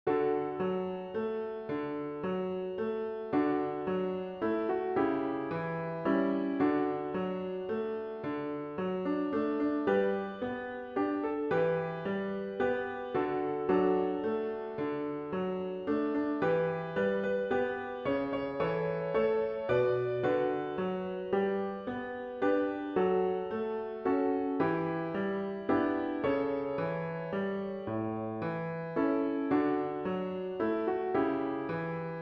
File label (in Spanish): piano; Cantata para SATB, Solistas y Narración